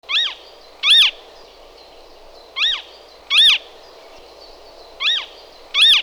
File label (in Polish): błotniak stawowy, ptaki; Przyroda ptaki i zwierzęta